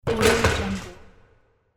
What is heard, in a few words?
basketball missed shot